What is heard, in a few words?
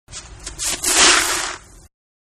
Baseball Softball Sliding Into Base; Baseball (Sound Effects:Sports); Manhattan Production Music